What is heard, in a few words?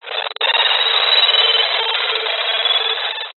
science fiction radio signal interference burst